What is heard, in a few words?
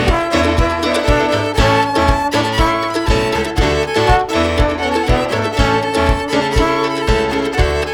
trombone